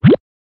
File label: slime despawn; slime rancher